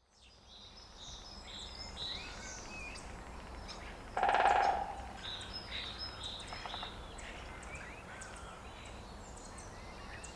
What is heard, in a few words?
Great Spotted Woodpecker Dendrocopos major